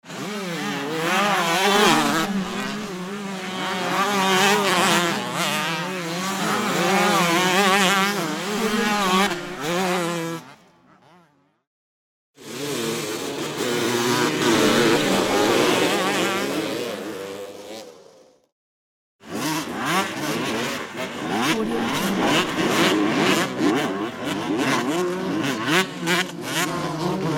bike racing tournament sounds

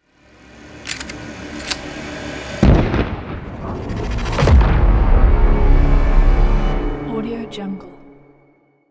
war gun logo; cyberwaveorchestra

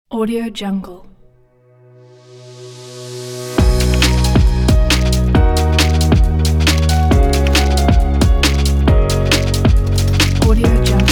futuristic technology uplifting electronic

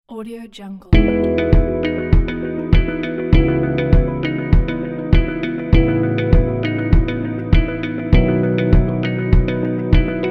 corporate travel

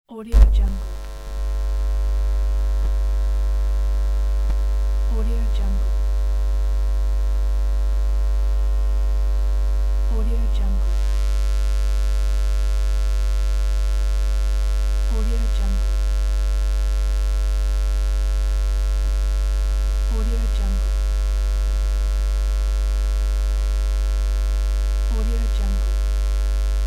cable disconnect noise

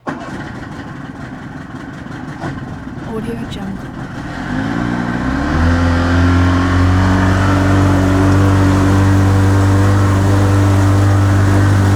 pontoon boat drive

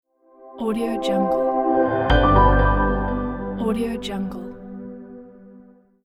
luxury car logo

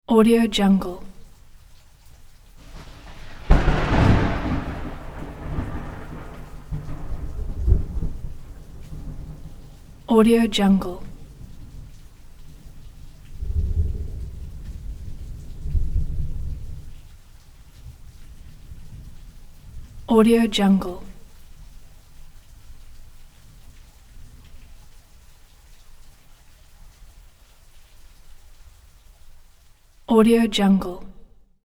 big thunder clap with medium rain; sound ideas

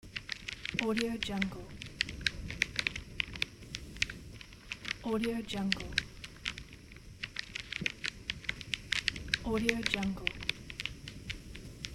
bonfire burning crackling

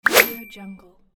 infographic whoosh

